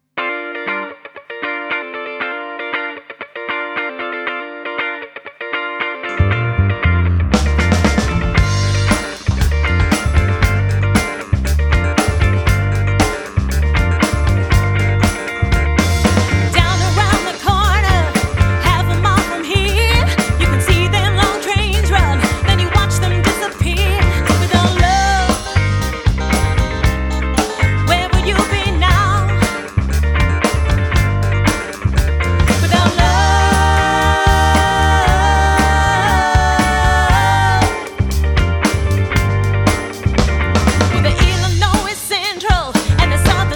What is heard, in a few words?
Rock Pop Band; Kent